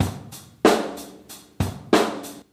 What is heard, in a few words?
Drum loops, Boombap